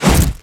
Creature Melee Physical; Kilta

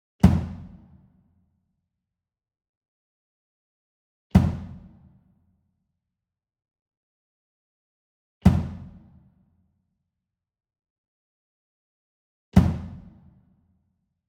Small Drum Kick Drum Soft Hit Medium; boom library; Cinematic Strikes Construction Kit

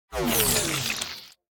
icecream shield off; Blind Drive

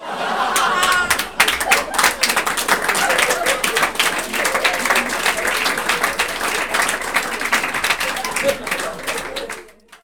applause laughs; best service Blue Box

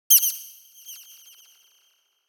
Bird Very High Warble - Very High; Hollywood Edge; sound designer's toolkit v2

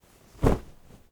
THUD Movement Before and After; mono; Universal Sound FX; THUDS & THUMPS

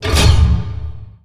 Door Large Metal Open; Doors

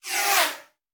Bullet Pass By; Sound Ideas; Guns

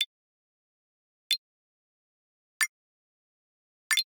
Generic Click Digital

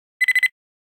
computer bleep; NCH Library

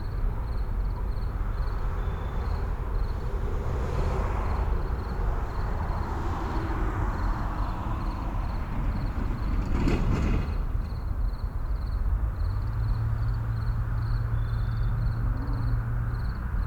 Suburban night crickets traffic light; urban and suburban; boom library

